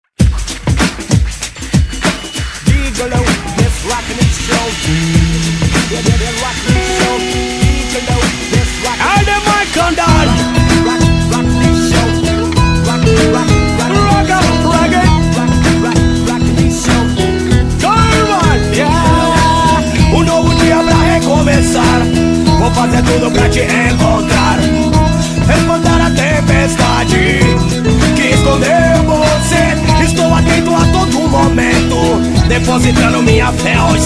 guitar

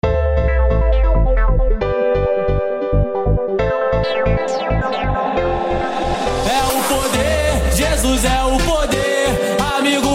gospel funk

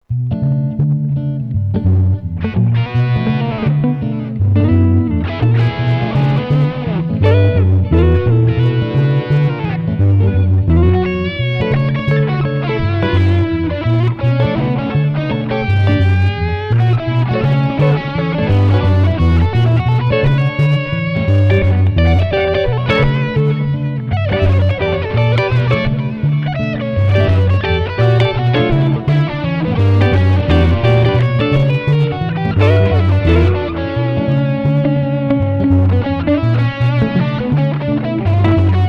jazz distortion

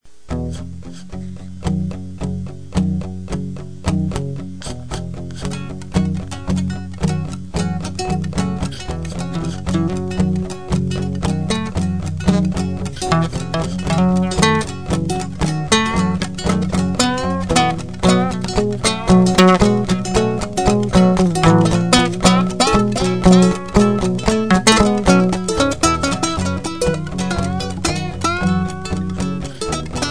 jam blues

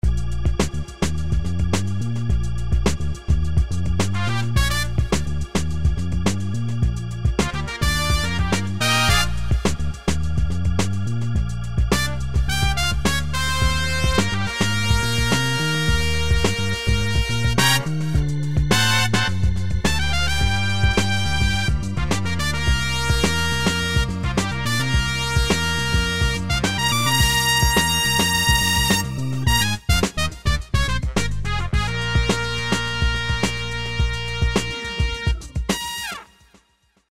soul jam

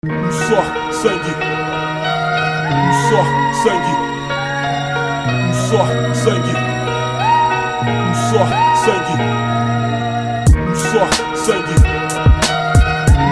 beat

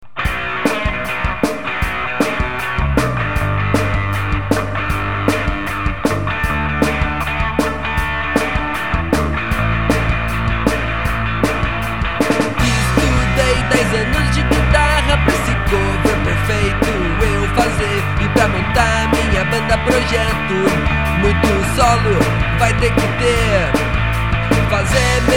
heavy hard rock melodic metal progressive core

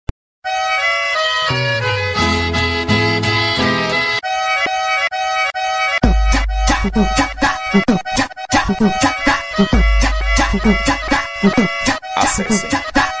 funk session

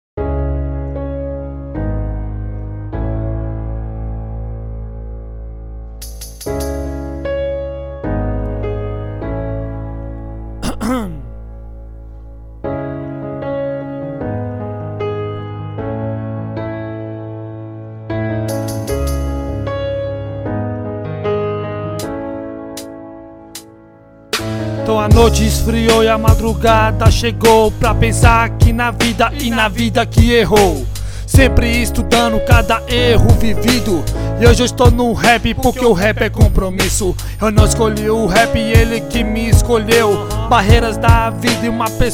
Rap

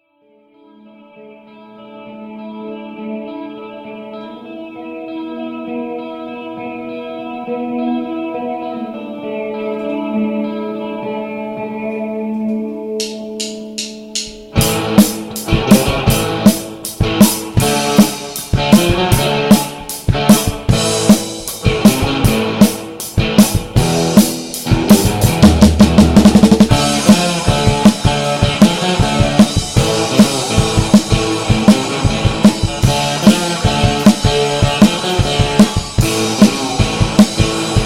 instrumental

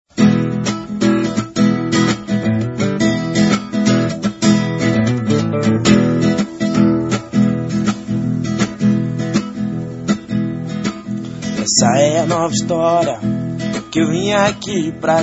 blues

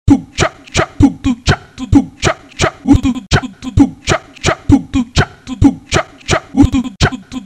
beat

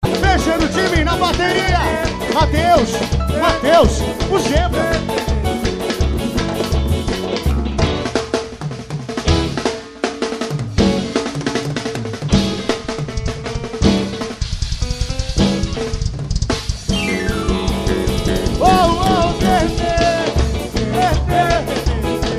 quick drum solo

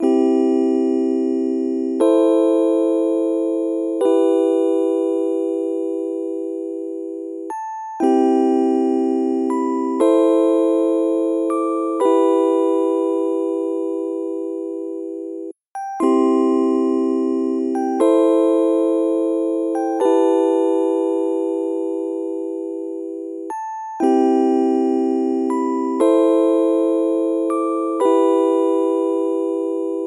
sad tune

